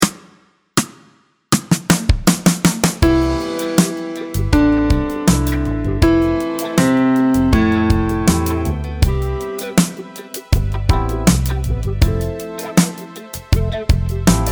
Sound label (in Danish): Imitation 5 toner C og F C dur; Melodien spilles i 2 takter, hvor du lytter til den.; Imitation del 1 kap 2 opgave 2